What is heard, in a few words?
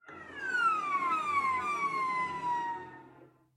Cello Seagull Effect; SoundsCrate